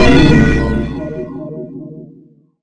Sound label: bad Noise